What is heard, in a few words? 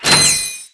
bolt